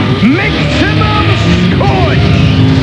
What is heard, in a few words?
pain; quake2; rattrap